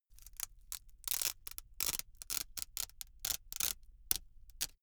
velcro large open really slow

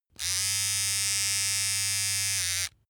razor small

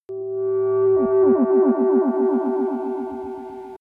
warp preparation signal

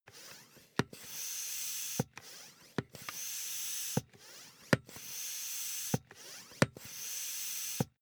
air pump ball sequence

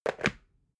water boiler put down

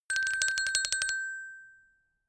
glockenspiel tremolo high short

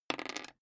die roll table

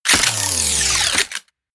wind down

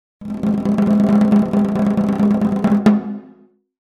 drum roll hit

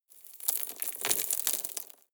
drop rock debris medium short

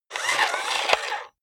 robot movement medium

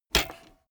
bmx bike pedal foot hit heavy

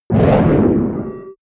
whoosh distorted swish hissing

